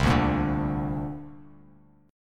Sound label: C 7th Suspended 2nd, C, down; strummed; chord; C7sus2